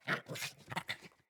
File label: Kubrow Puppy Idle Growl Playful